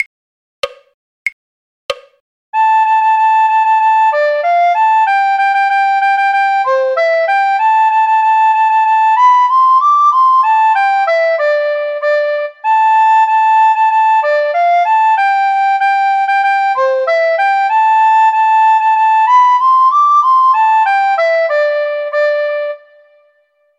recorder; Drunken Sailor; folk

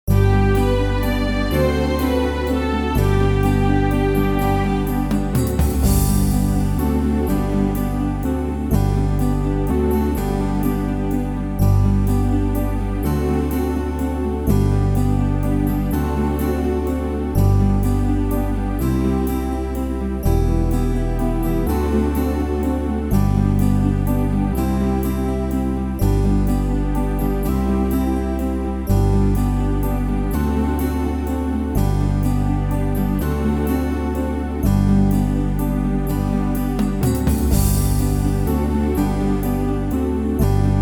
backing; sweet nightingale; folk

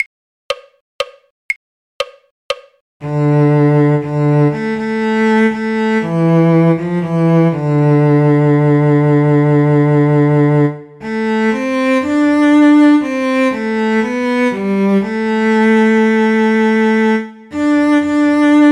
cello; scarborough fair; folk

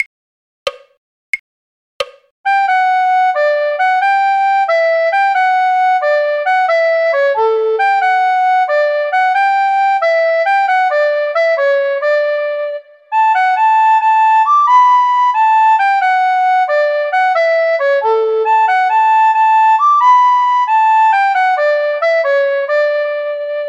recorder; folk

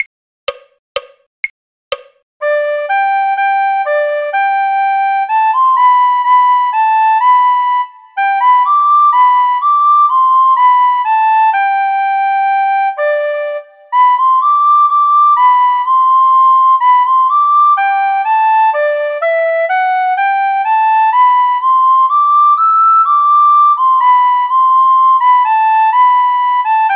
recorder; sweet nightingale; folk

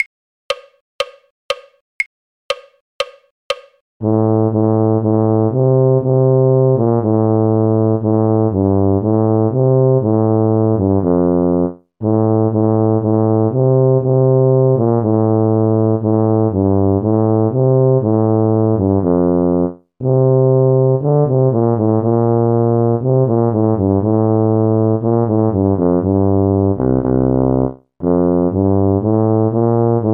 tuba; carols

